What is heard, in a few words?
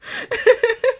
Teenager Small Smile